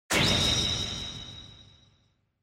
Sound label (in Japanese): Onoma Syakiin Heavy, 「シャキーン」といった擬音で表現される、ロボットや戦隊の決めポーズのイメージで知られている効果音です。